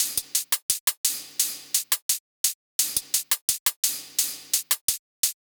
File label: Drum 172 top; Cognition